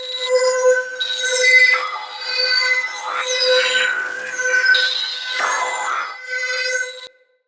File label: Defend Song Loop; Teralyst; Vomvalyst